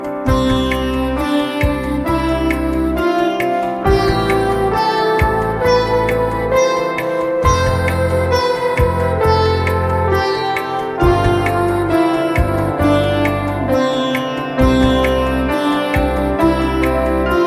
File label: Music Ringtone